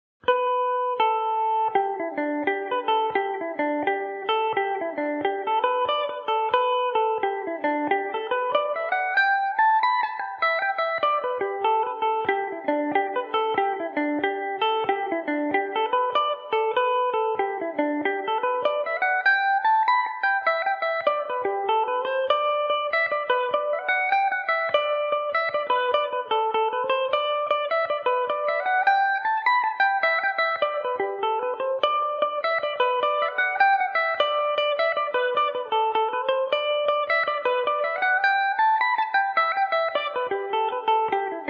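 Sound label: G major revelation, Irish mandolin; anthony frawleys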